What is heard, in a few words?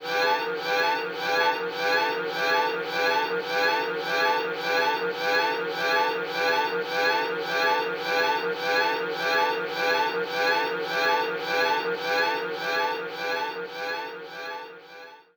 Jingle Before the Mid-Boss Battle; r-type final 2 homage stage soundtrack vol. 3 2022